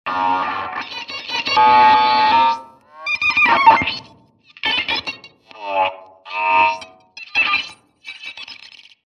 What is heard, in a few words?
Jazz Guitar; Ueberschall Jazz Guitar